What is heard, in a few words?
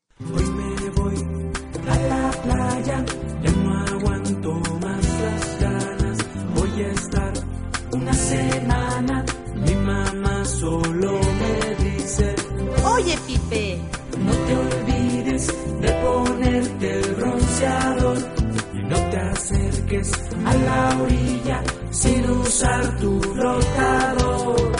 pipe